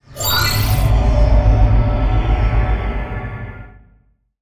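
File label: Summon Synchro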